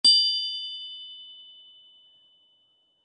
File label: Accent Glockenspiel Chime; Accent Glockenspiel Chime Bright High; Mallets; Blastwave